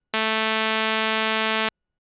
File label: Pulse A3; fleet keys Samples